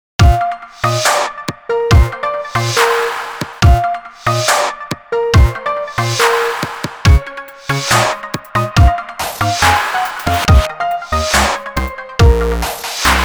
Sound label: SYNTHS CHIP STEP